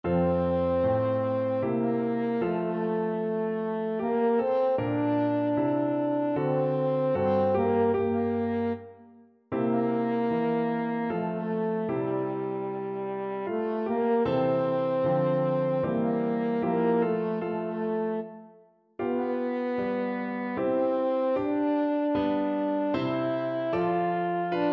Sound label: land der berge austria, french horn